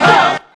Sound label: huh group of men